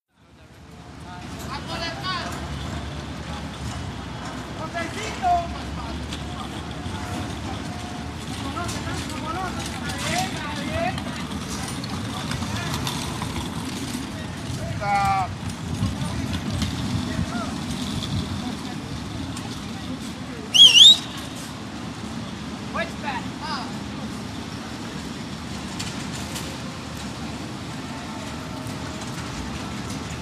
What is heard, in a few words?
NYC Garment Dist BG, big city